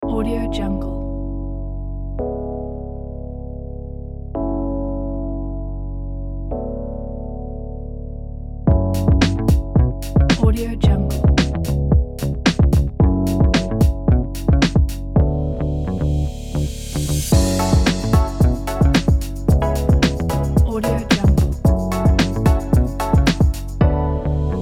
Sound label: pop upbeat electronic vlog background